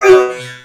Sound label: Male Hurt Robot; Mars Sortie